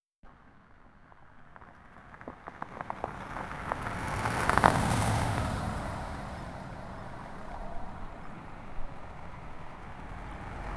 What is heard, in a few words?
car pulling into a gravel; braking